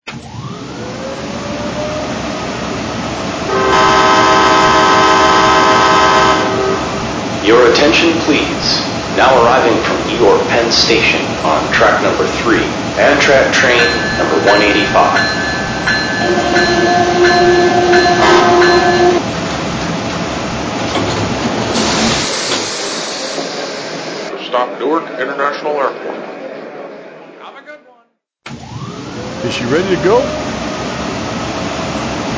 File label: AEM-7